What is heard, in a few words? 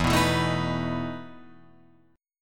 E Major 7th Suspended 4th, Guitar, Open F